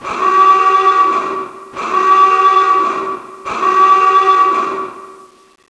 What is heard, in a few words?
siren; cstrike; DayNightSurvive